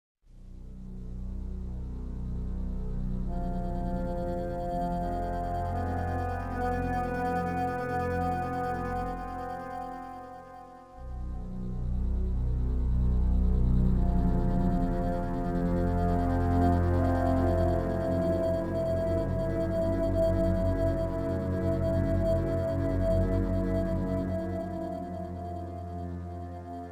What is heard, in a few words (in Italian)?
tensione